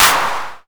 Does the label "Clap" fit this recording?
Yes